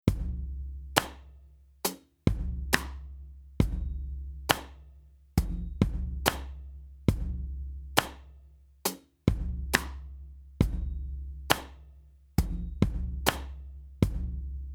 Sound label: drums